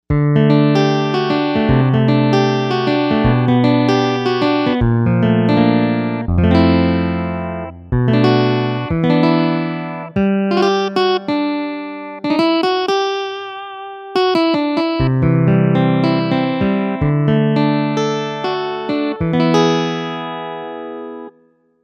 Acoustic Guitar, Novation UltraNova; Factory Patches

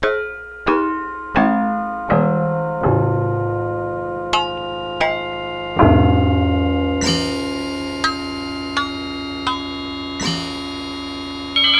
piano harmonic bell tree

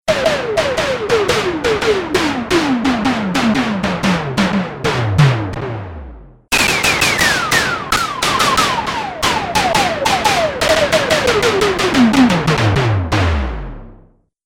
ELECTRIC TOM, Korg DW-8000; Factory Patches

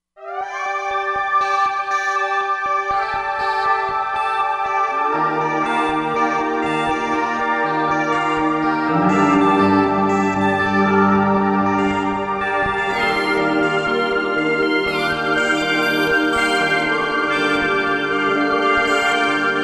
Roland Fantom XR; Strobe X

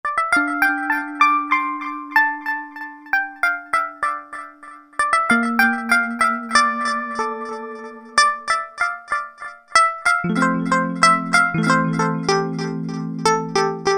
2 voices; Korg MSC-12S Synth 3

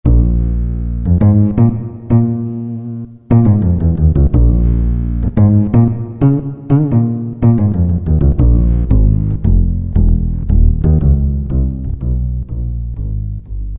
Natural Bass, Roland PN-D50-04

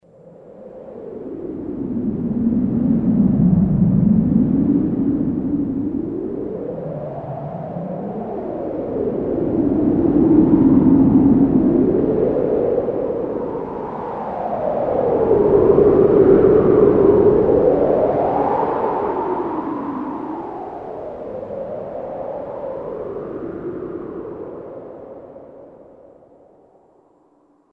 HOWELLING; Akai SG01v

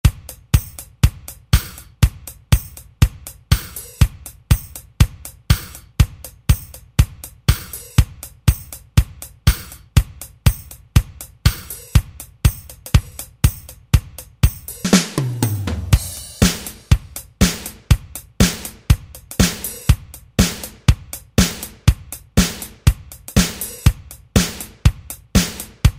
Country, Alesis SR-16; Preset Patterns

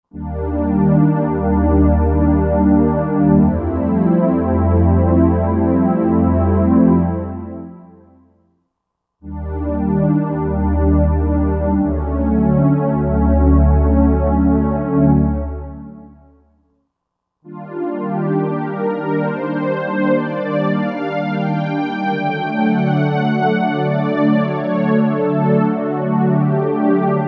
Roland JV-2080, Warmth

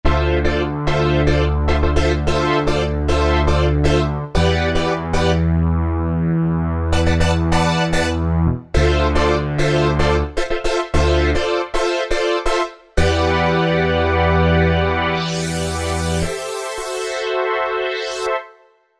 JP8 Brass, Roland V-Synth; Factory Patches

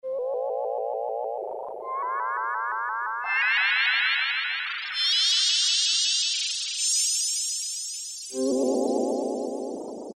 SpaceTexture, E-mu Proteus-1; Presets

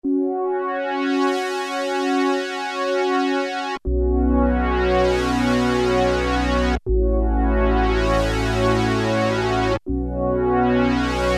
Filter Flow, Roland JX-3P

